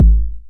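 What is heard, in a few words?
909 BD tape, kick drum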